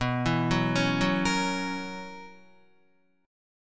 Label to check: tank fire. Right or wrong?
Wrong